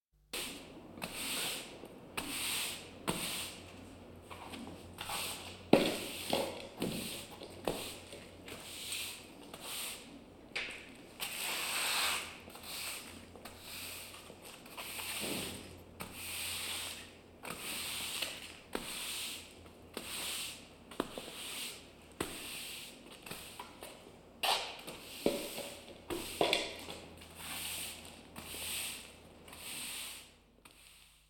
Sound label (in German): kehren; Alltagsgeraeusche